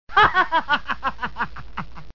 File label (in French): rire